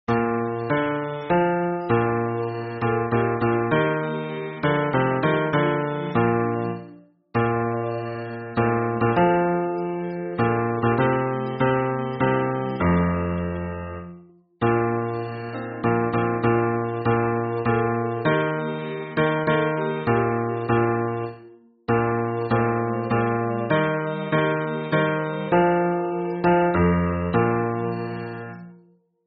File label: hymn bass